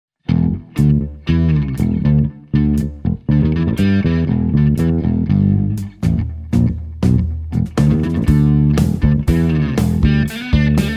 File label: Ruff Riff